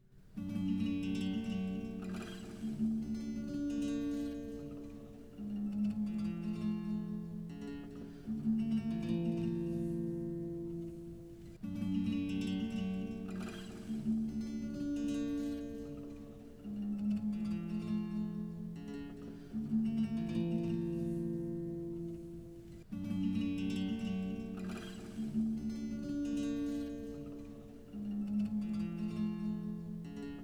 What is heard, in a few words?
A Slow Wave